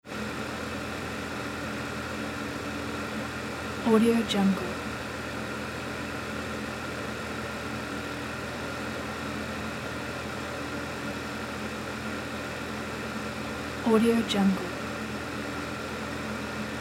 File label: tennis court air dome pump noise